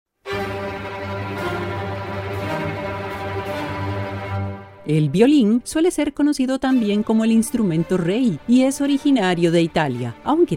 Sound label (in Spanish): el violin